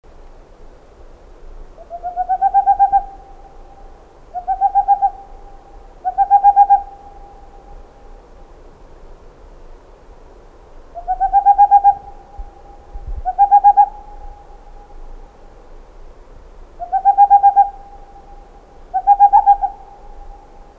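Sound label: boreal owl voice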